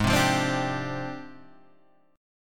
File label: F Minor 6th Add 9th, guitar chord